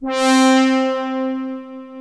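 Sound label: analog trumpet, synth pad; InVision Interactive; Lightware VOL-1; Instruments & Percussions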